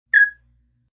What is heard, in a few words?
Chirp; Chirps